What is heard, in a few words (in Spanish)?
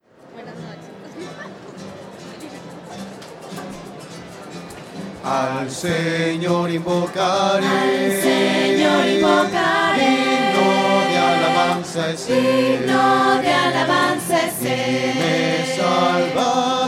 voces; al sennor invocar; misa